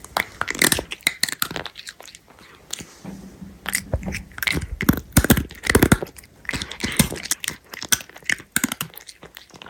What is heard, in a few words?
megacrunch